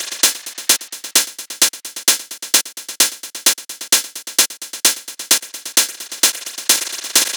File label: Hat Loop 130, Techno; Ghosthack; Free Techno Sounds 2024; Hats